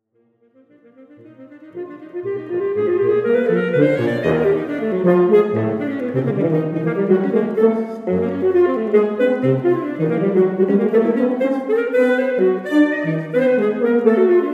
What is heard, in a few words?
Fuzzy Logic, for alto sax and tuba; Phantasmagoria Ensemble